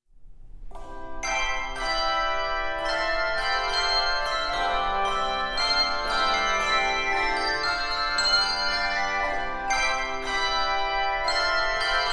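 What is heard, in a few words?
Handbell Music, Handbells 3-5 Octave; Chorister's Guild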